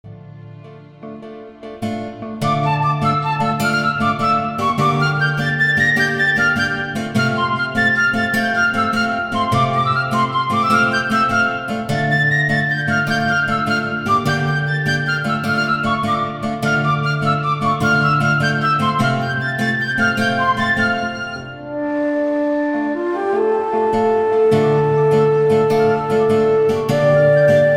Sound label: happy flutes